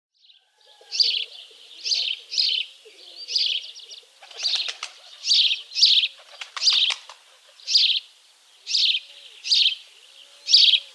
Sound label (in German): feldsperling, vogelstimmen